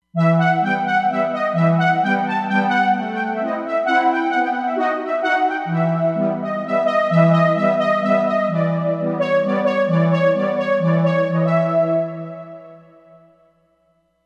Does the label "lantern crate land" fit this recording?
No